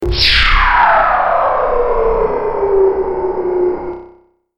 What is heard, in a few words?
game over fail; Ben 10 - Alien Catcher